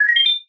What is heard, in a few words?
beep; bufffiveseven